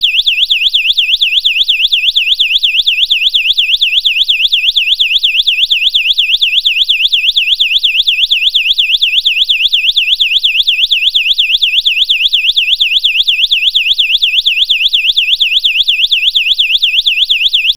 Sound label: SIREN, Piezo Siren